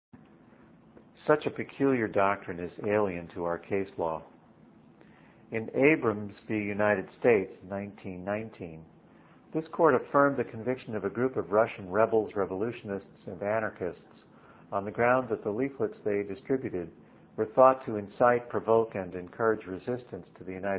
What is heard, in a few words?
Morse